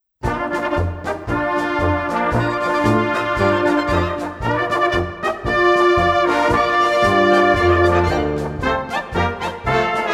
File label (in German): Polka